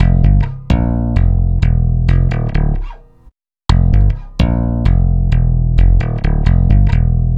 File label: Stick Loop, Stick Bass; Sonic Foundry; Leo Cavallo Bass Taster